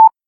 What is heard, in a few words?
timer loop